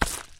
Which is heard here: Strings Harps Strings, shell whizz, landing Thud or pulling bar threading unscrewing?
landing Thud